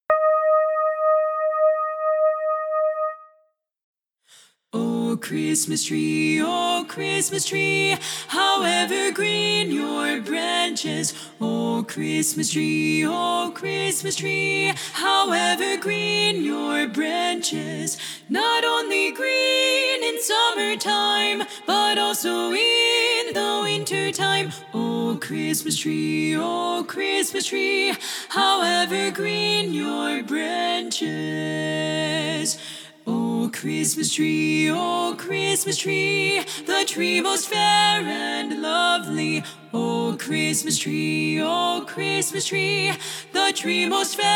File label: O Christmas Tree (SSAA) Lead